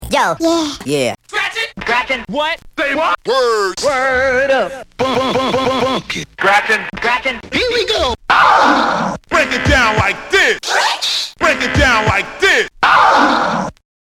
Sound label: scratch sentence